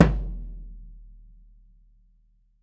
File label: SURDO FF, Brazil Drum Perc; E-MU Producer Series Vol. 7 – Old World Instruments